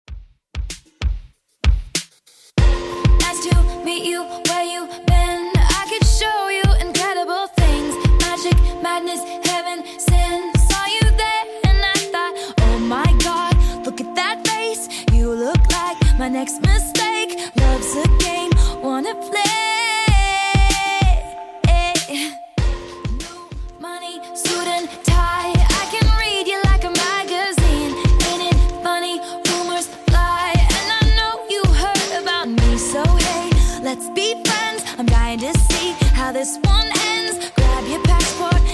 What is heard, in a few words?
2ks Redrum 96bpm; remixplanet